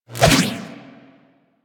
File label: Electro Prod Whoosh; Warframe; Weapons